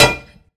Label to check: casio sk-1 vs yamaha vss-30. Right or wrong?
Wrong